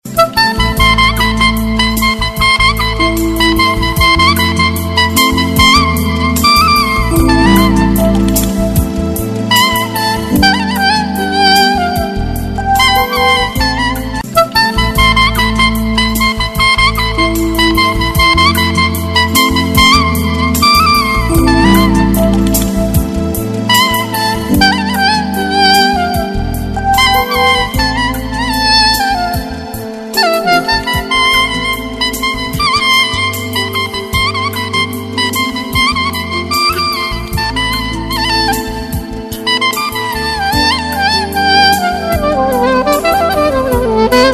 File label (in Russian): klarnet